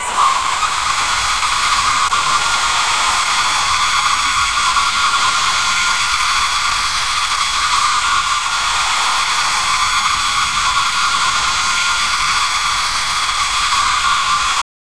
dystopian hip hop skit

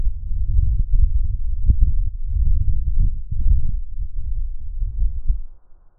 low cold wind faint

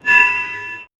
a close up asmr style fol, table

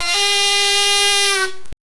the quack sound is simila; childlike

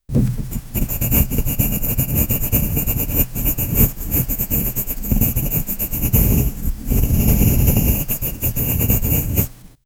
smooth slow pen swirling, writing

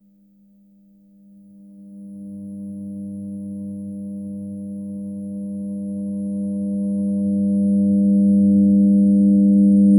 electric sound like led, led

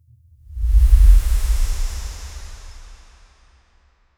big bang then portal open

